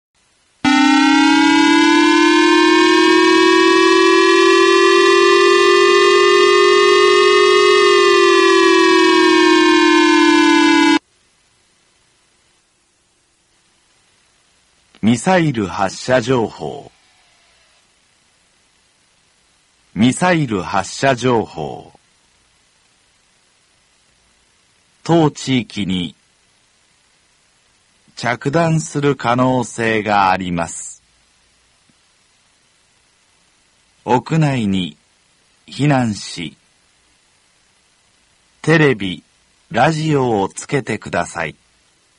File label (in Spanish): Sonido de alerta de misil sobre Japon